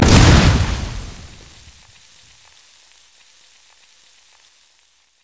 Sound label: noxian thermal charge; LoL; urgot